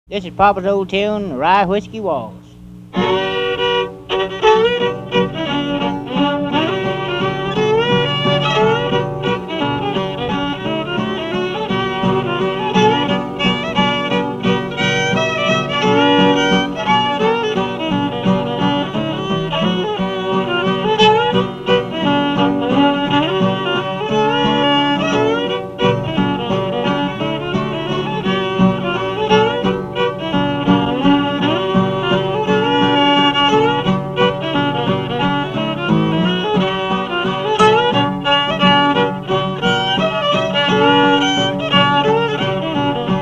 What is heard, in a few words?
Waltz, Fiddle; Rye Whiskey Waltz; McAdoo Fiddle